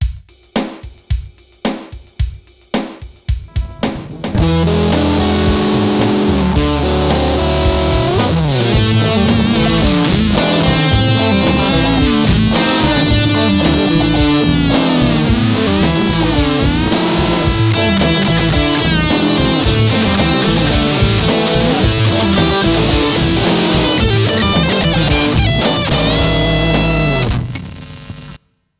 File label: big muff usa; effecter